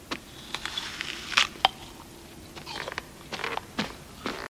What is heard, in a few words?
biting an apple